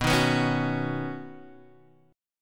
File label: C sharp 7th Suspended 2nd Suspended 4th, B